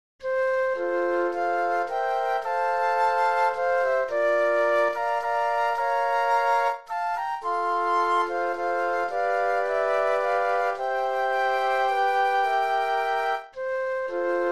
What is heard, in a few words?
Flute Quartet